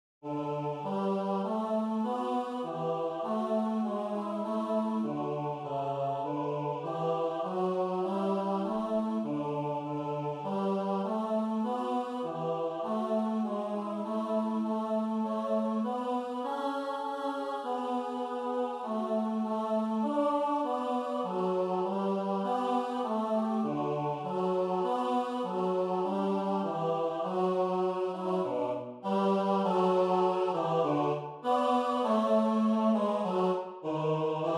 Bariton, Barn Jesus i en krybbe lå SAB

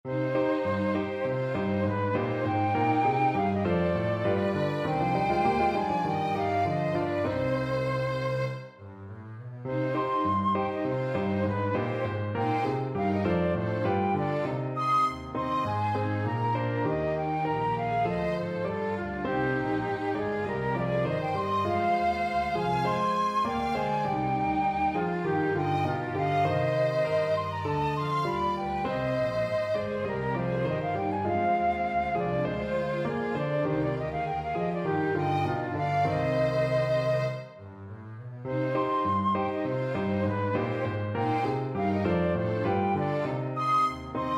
Ruti akkompagnement kun m. alternativer

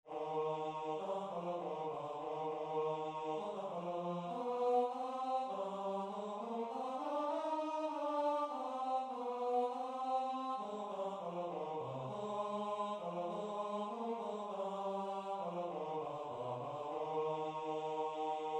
Ruti tenor

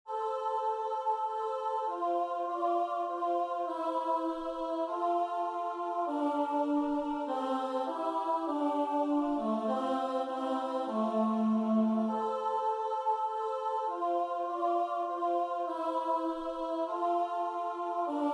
lyd Alt SAB; En rose så jeg skyde

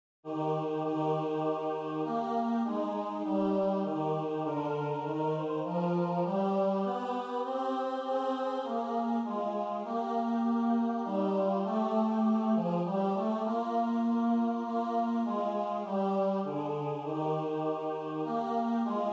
lyd Bariton SAB; Det kimer nu

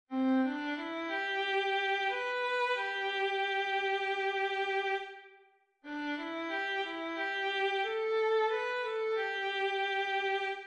Sopranstemmen

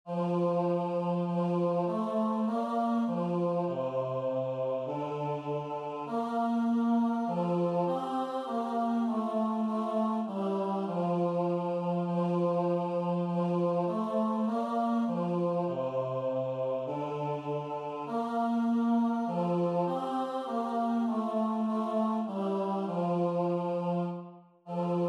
Bariton, En rose så jeg skyde SAB

